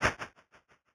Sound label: Flee, Battle SFX; Leohpaz; RPG Essentials Free